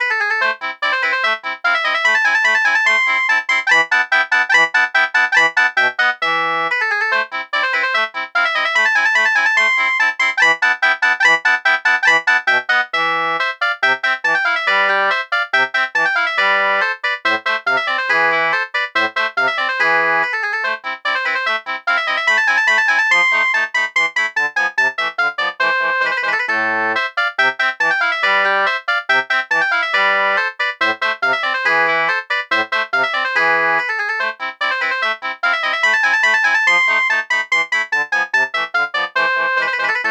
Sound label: shenai